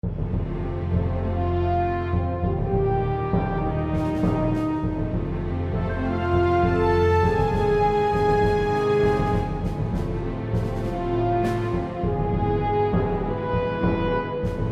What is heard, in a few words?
race; Galactic Civilizations II